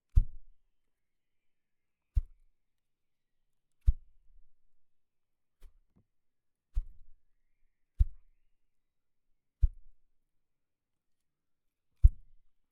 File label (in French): coup baton dans vide; Percu Bois; LF